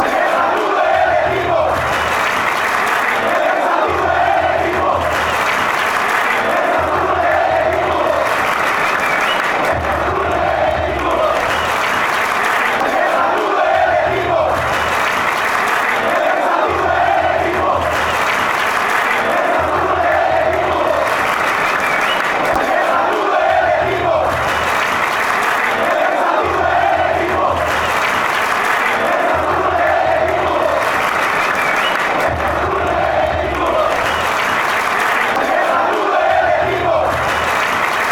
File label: paraguay chant